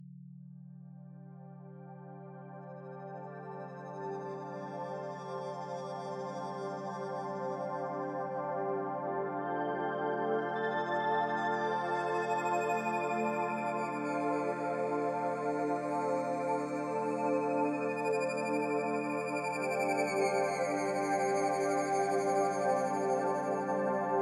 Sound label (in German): BB 40Hz mit Ambient Sound, binaural; Gamma; Fluss